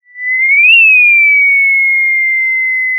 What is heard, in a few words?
Sound of a police car siren